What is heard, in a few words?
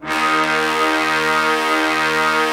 BRASS D#3, STEREO BRASS; Greytsounds VOL-1